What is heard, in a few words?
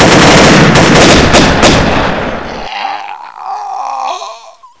Scream